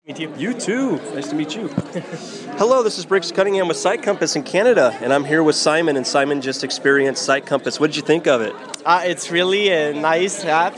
techno

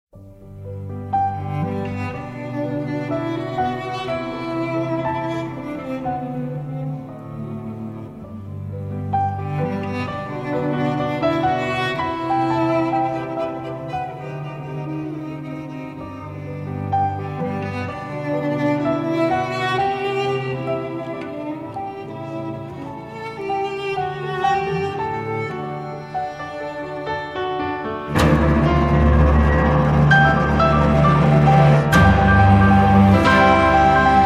strings

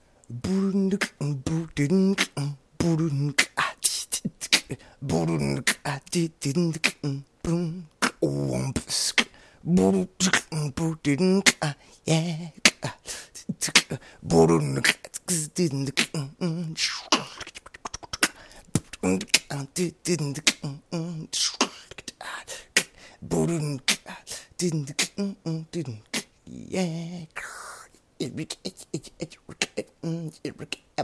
beatbox; crashing out after my 1st day of sxsw

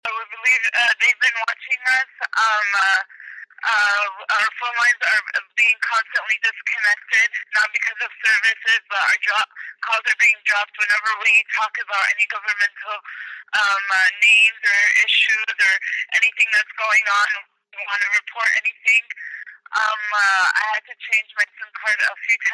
echoes disconnects tones; tripoli; reasons we suspect phones tapped

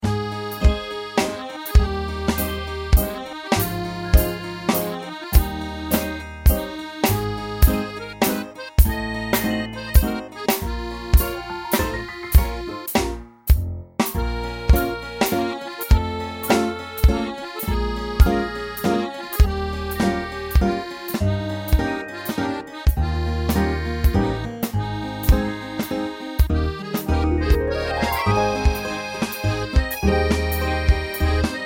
3\4 finished garage band

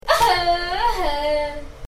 haha

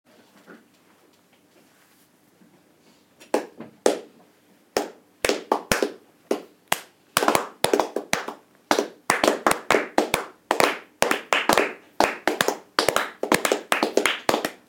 clapping piece

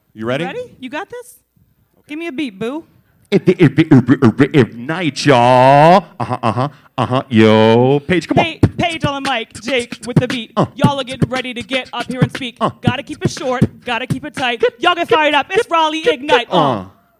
intro rap

